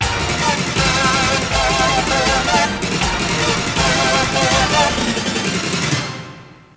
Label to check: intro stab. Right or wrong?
Right